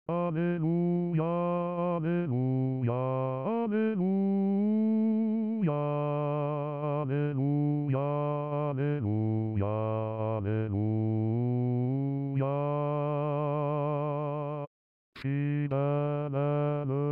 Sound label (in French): basse; alleluia de lalliance